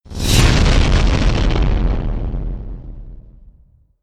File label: destruction slams impact blast